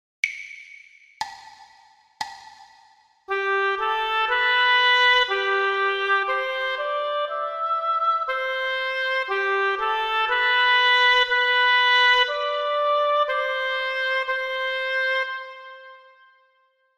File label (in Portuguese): percepcao ditado